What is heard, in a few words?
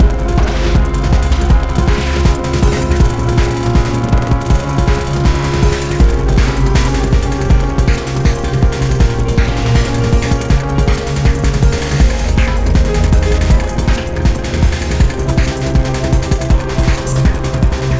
Waterworks Murky Fast; Rive: Wreck, Hack, Die, Retry; PS4